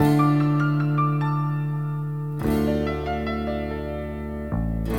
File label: piano, Sad